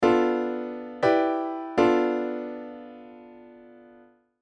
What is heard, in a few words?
Locrian i iv; Modal Harmony: An In-Depth How-To Guide